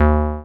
303 Short Release; Zero-G Analog To Digital 1 & 2

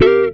GTR CHORD; Univers Sons; Jazzistic

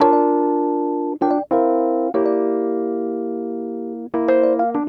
Smooth Groove Rhodes, 70s Analog Funk

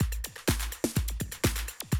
125, Classic Drum Loops

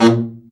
BARI, BARITON SAX; Sound & Vision - Gigapack II CD 1

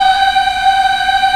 VOX African; Roland LCDP11 Africa VOL-1

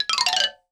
balafon; World Instruments 2; WORLD PERCUSSION; PS BALAFON

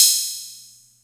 Music Percussion Computer HH open, Drum Machines

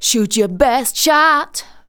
vocals sung; Techno Trance Essentials

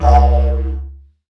Didgeridoo Kit Samples

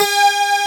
SYNTHESIZER DIGI DECAY; AKAI S6000 CD-ROM - Volume 1

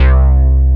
SUP BASS; Syntec; Wall of Sounds

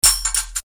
Percussion, EuroDance; Best Service; Ethnic Journey Sound Cube; EuroDance Essentials

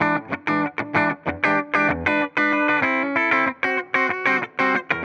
Tele 95 E, Guitar, 95bpm

